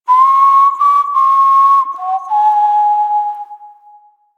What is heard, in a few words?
Camp Whistle; Warframe; Quests; The New War; Drifter